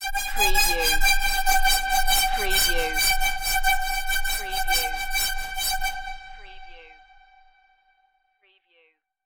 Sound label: Evl Hi Tensn